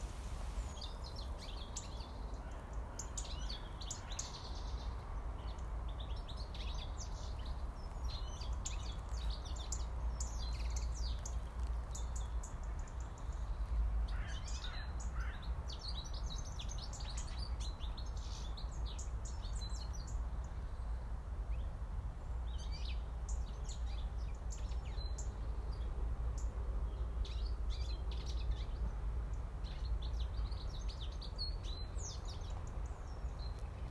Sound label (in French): chant chardonneret